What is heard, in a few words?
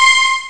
Siren, Amiga Soundtracker; ST-XX Amiga Soundtracker Sample Packs